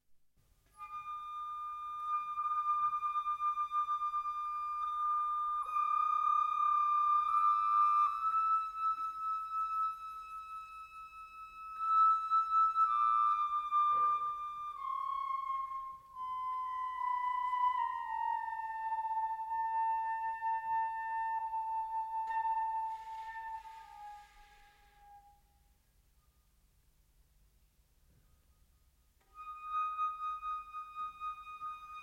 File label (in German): Blaeserquintett und Kontrabass; 5 Stuecke fuer Blaeserquintett und Kontrabass